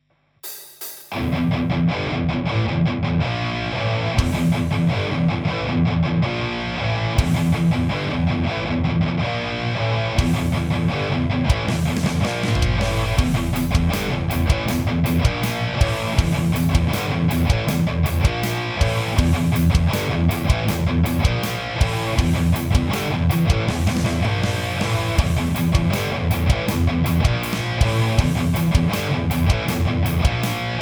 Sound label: 6L6; emg81; g12k100